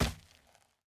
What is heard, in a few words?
dirt land